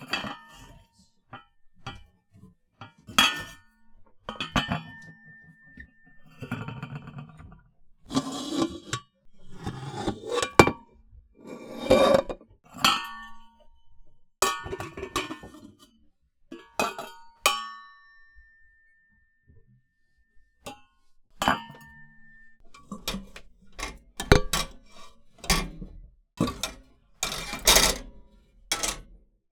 Sailing

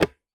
Single Shunt, DVD Drive External

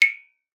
Drips

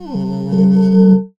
Finger Rub, Large Wine Glass

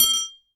Aluminium Tube Drop

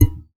Classical Guitar Extended Technique

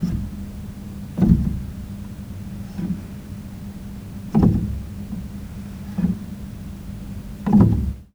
Hammer Rub, Piano; Piano Miscellaneous

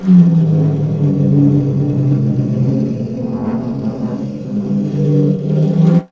Snare Drum; Percussion Samples

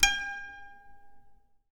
Classical Guitar Extended Technique Plink

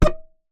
Melodica Short Note

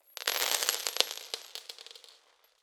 Spill On Floor, Medium Wine Glass

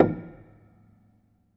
Hamm Perc, Piano Hammer Perc; Piano Miscellaneous

